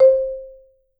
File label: soft C4, Marimba; Sampleheads; Dave Samuels Marimba & Vibes